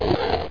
orc; landslor

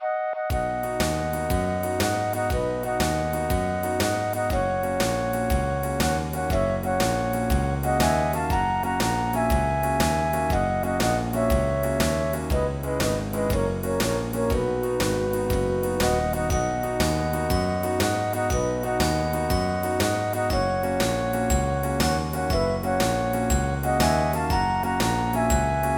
music

